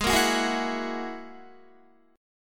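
A flat 7th Suspended 2nd Sharp 5th A flat Guitar, chord; Ab7sus2#5; 16,13,16,13,16,13; strummed; 4E,4A,5D,5G,5C,6F; chordshape; JGuitar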